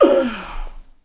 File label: Death; TR3